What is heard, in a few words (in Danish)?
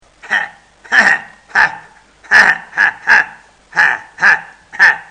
sjove grin, bogholder